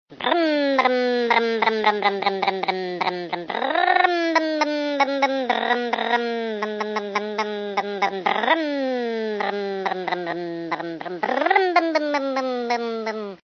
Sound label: funny brrrrrmmm